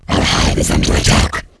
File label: alien hive attack